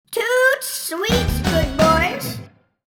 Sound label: toot sweet